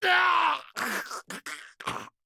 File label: BeachGuyScreams; Dying Exile; NPC